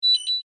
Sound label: Beep Electronic, Scifi, Beep, Electronic, Science, Fiction; Chime; Tech; Blast Wave